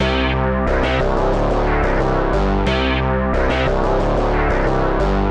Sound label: Bass loops; Zystrix